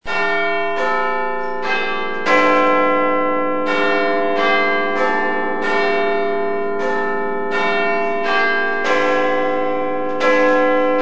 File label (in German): Big Ben Vollstundenschlag ohne Big Ben